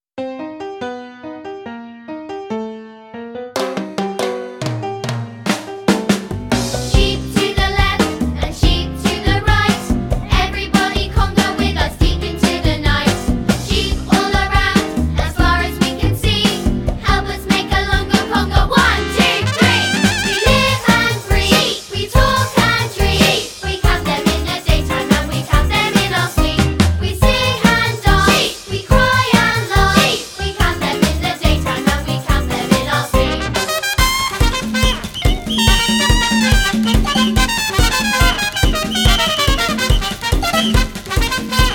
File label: Conga Vocal